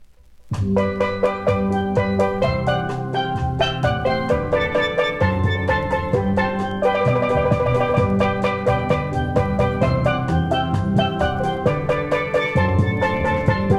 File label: TRINIDAD STEEL DRUMMERS